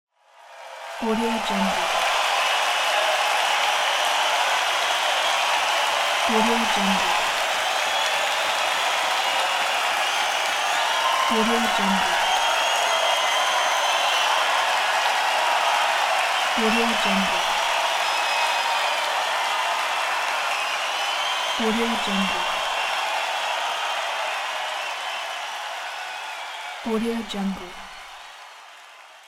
crowd cheer

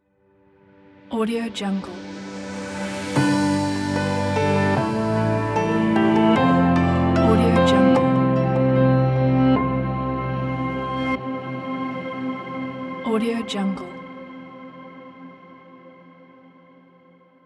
ambient atmospheric light logo

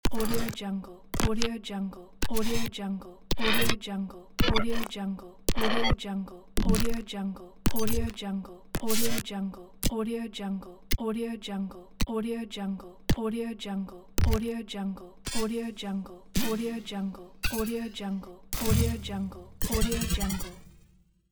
glitch infographics sounds pack

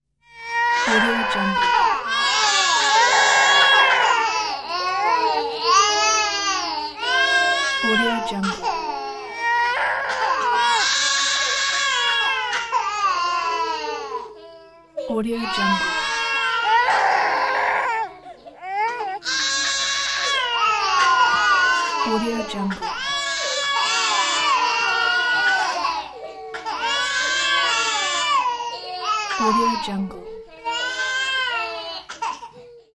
babies crying sound

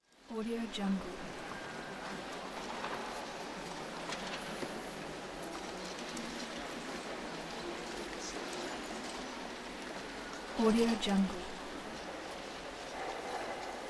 library hall ambience; stereomarket

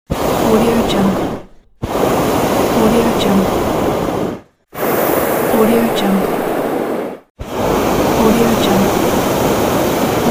balloon burner; palmirasoundstudio

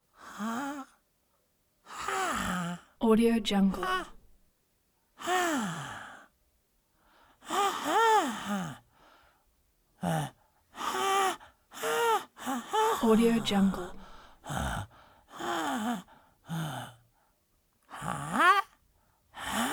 creature voice experiments surprised reaction

